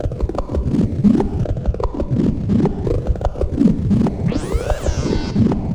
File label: Draino Loop A; Cyclotronic Resonator